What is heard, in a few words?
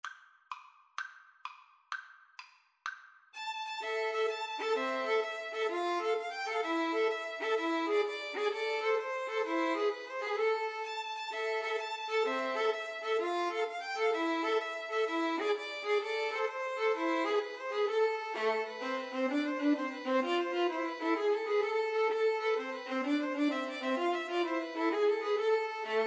tarantella, 2 Violins Cello